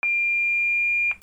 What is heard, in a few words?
INDUSTRY